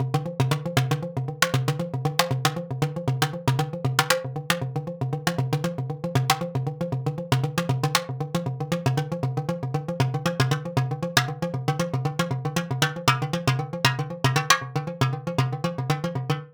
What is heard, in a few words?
physical modeling; klangsynthese